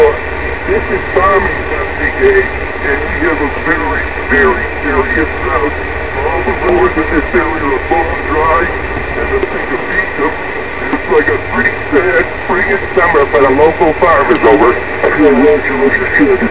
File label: vox; Satellite Contacts